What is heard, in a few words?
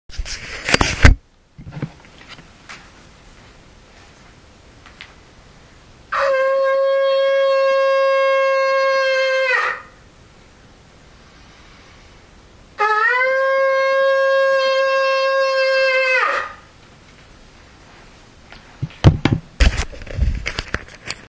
hunting horn